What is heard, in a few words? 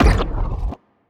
anti fire rate laser hit, weapons; biogenesis